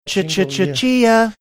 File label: singing cha cha cha chia